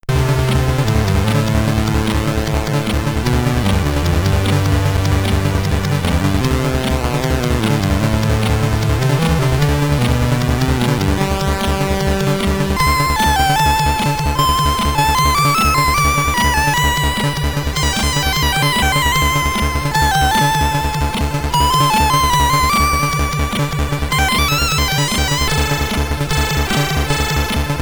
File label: Stage BGM, SID Stereo, commodore 64; Hammerfist